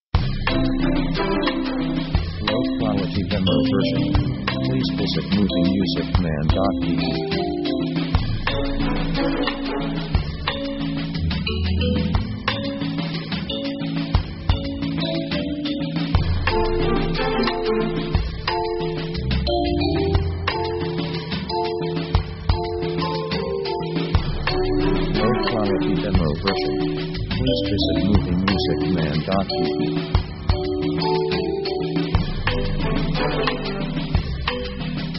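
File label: orchestra easy latin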